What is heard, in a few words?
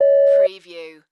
Beep Single; sound effects bible